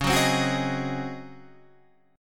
C sharp Major 7th Suspended 2nd Suspended 4th Guitar Half Step Down; C#M7sus2sus4